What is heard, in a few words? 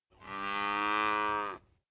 Cow Moo, ringtones